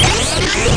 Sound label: merchant bought; Gonner